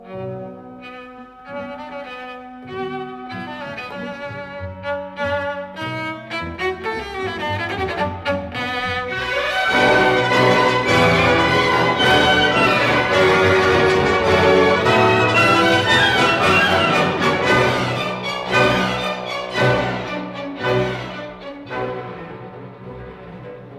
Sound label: Dvorak Cello Concerto